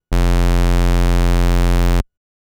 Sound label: Sub F1, keys; fleet keys Samples